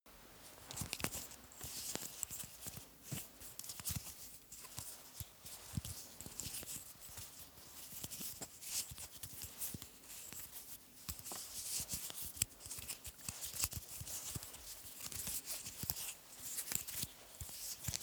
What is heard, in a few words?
makeup box stroke handling; haus-garten; badezimmer